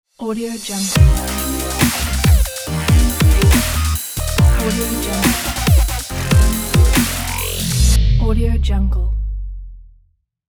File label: modern dubstep intro logo